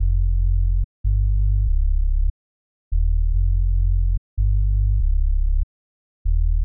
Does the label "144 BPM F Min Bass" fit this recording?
Yes